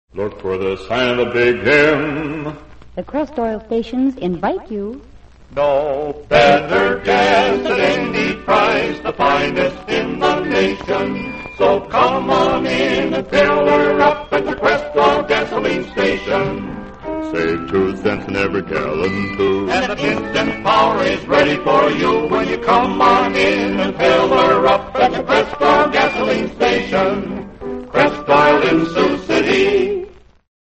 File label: radio jingles; crest oil; allied radio artists